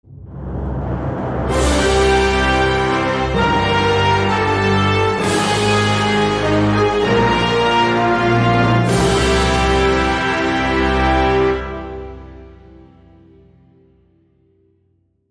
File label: Sting Celebrate; Kingdoms of Amalur Re-Reckoning Fatesworn